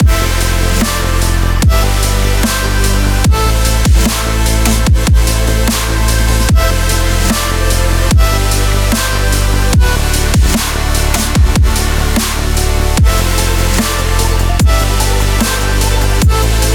emotional energy dubstep loop

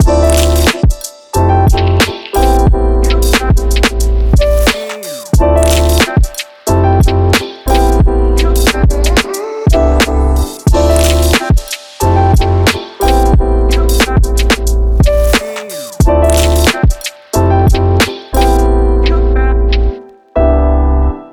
urban vlog hip hop

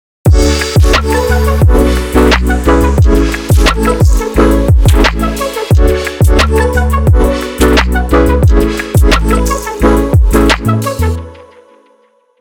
vlog hip hop

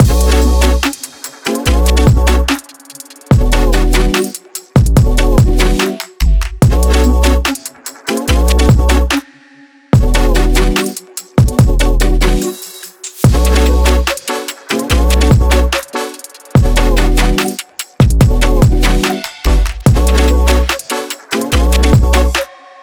stylish loop

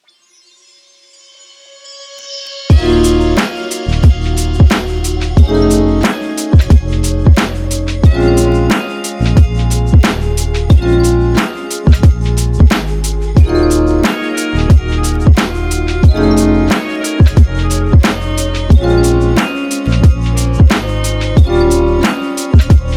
sexy chill

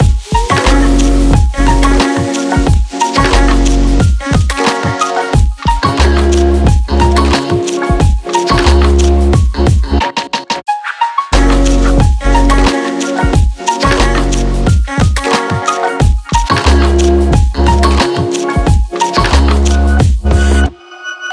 calm urban hip hop loop

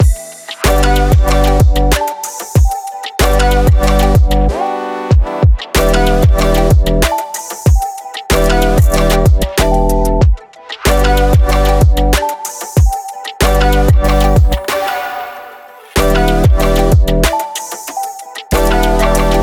summer jam loop

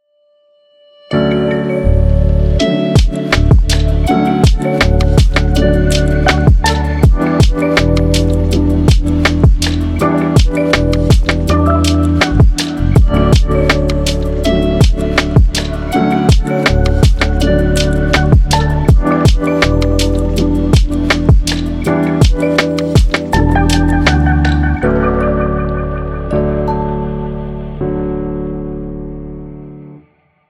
relaxation study tape

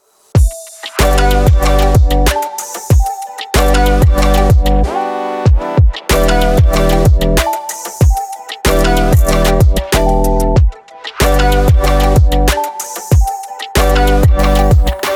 summer jam

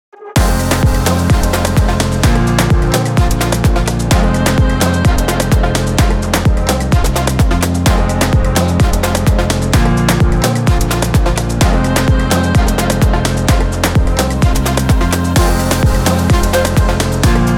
adventure travel

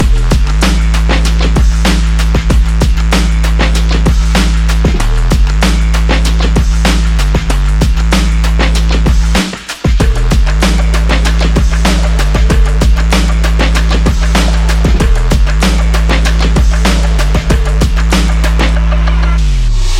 urban speed loop

